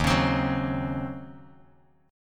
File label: D sharp Minor 13th D sharp Bass Standard, chord; strummed; JGuitar